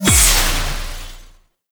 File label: Void Dagger Proj Explosion, melee weapons; Warframe; Zariman Dagger; Void Mode